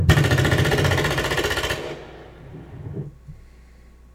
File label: Gunshots